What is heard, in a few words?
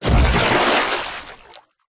water impact hit deep surface designed; nature